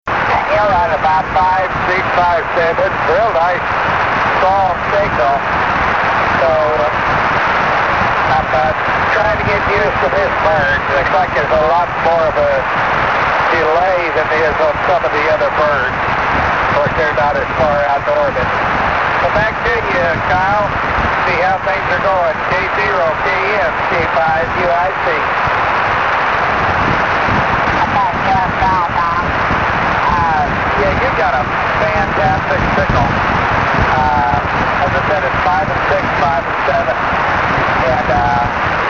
ao40 qso

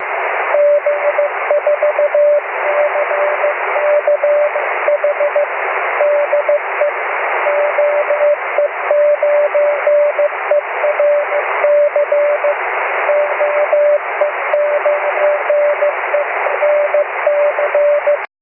cw; oe9erc

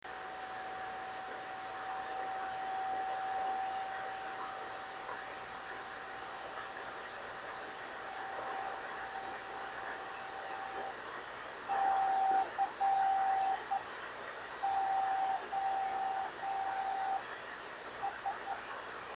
driftnet beacons; 26.880

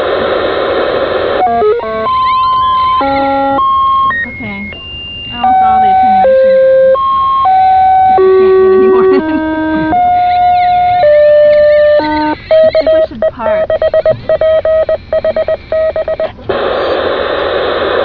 Beamed squeaker

